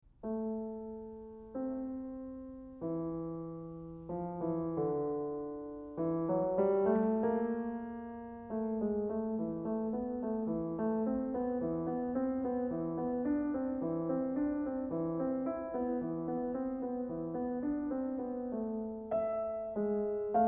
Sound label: Duet in A minor, BWV805; Bach - The Art of Fugue; Bach, J S